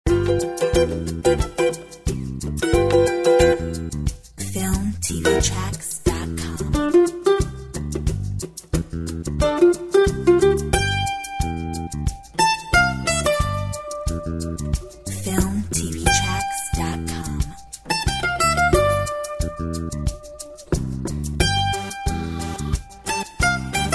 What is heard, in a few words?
Mandolin groove; Filmtv-tracks